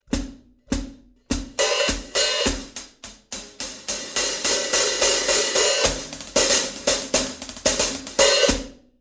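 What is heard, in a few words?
rock hihat 14, cymbal; sabian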